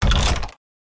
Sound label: door open